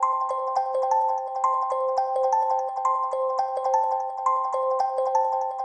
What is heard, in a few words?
Liqueed Sanse, drum bass